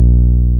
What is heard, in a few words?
BAS TB303 B2